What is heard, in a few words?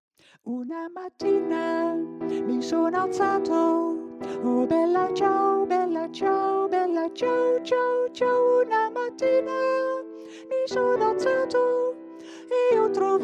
Tutti; Bella Ciao